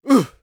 attack voice; UFC Tapout; XBOX

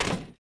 fs metal, droids robots

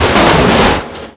blow roof; Grand Theft Auto Vice City; XBOX

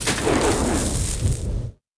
impact powder; Quantum Redshift

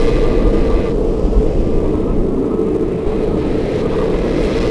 Ambient

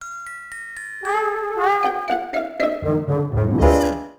Tension

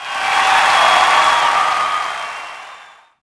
POS Reaction,Crowd; Cars; XBOX

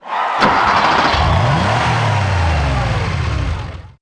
start fx left; Pure Pinball

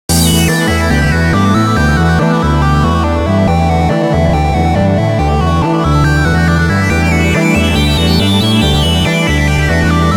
Pinball, Music; Pure Pinball

